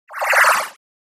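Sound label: Wash Fluttering Electronic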